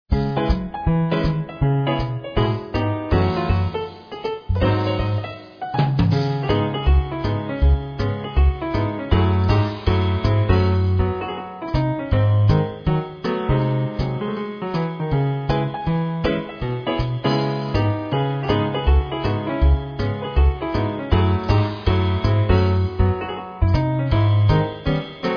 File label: music; fitz-2; shockwave